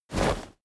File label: whoosh soft; swooshes-swishes